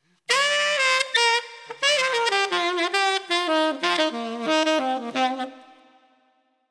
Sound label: Calling Out Longer 12 Beat Dm 90